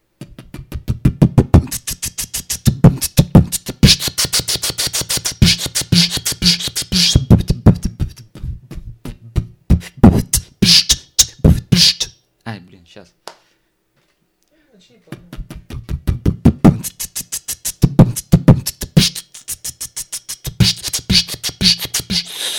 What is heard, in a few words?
wake up bbox